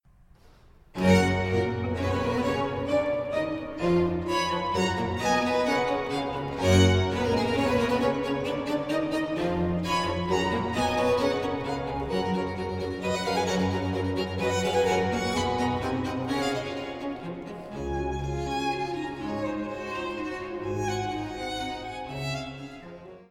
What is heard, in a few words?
G major for 2 violins and basso continuo Allegro